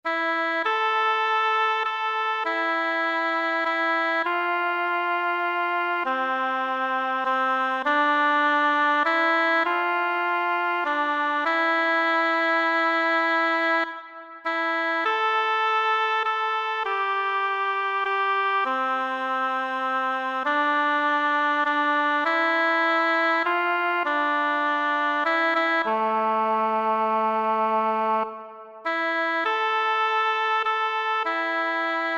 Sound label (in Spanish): flautas soprano; El Cid